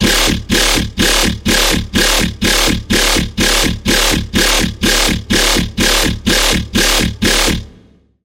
Wobble - Grinder; Routed Sound; Serum 2 Expansion - Chaos Bringer Vol.1

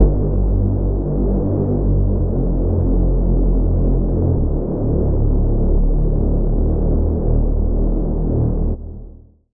Reese; Routed Sound; Sylenth1 Expansion - Noble Gangster Vol.1

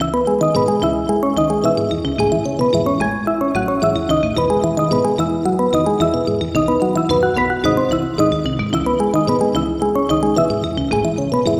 Pluck; First Paint; Routed Sound; Vital Expansion - Story Walker Vol.1

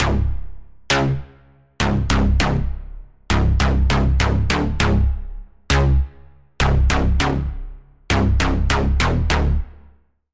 Pluck, Sylenth1

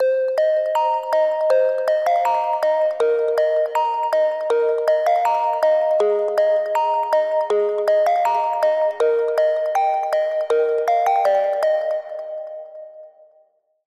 Pluck; Routed Sound; Spire Expansion - Flame Summoner Vol.1

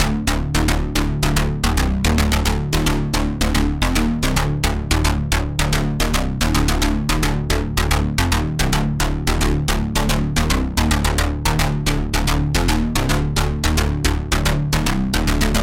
Bass; Bane of Shadow; Routed Sound; Serum 2 Expansion - Doom Carpenter Vol.1

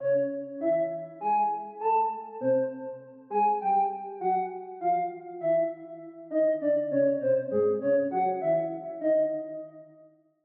Woodwind; Forest Anthem; Routed Sound; Vital Expansion - Story Walker Vol.1

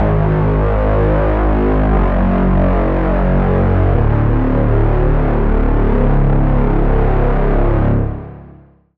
Reese; Routed Sound; Serum 2 Expansion - Noble Gangster Vol.1

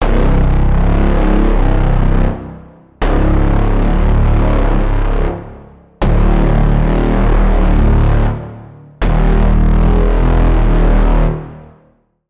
Braam; Parallel Void; Routed Sound; Vital Expansion - Quantum Enforcer Vol.1

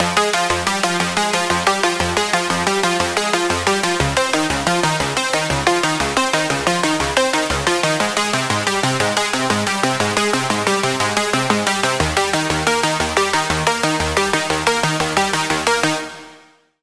Pluck, Sylenth1; Pluck - Lonely Planet; Routed Sound; Sylenth1 Expansion - Star Scavenger Vol.1

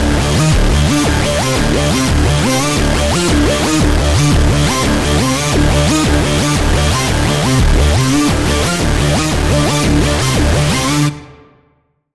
Lead High Gate; Sylenth1; Routed Sound; Sylenth1 Expansion - Quantum Enforcer Vol.1

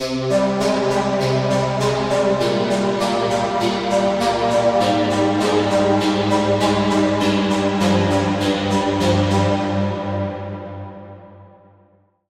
Key; Routed Sound; Spire Expansion - Reality Maker Vol.1

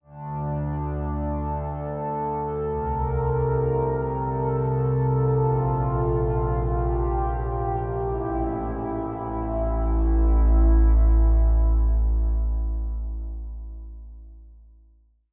Pad; Routed Sound; Sylenth1 Expansion - Reality Maker Vol.1

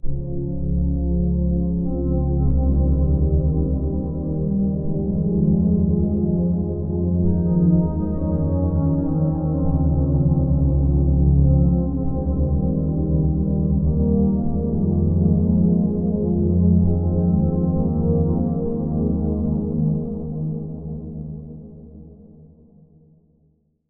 Pad; Pad - Limbo River; Routed Sound

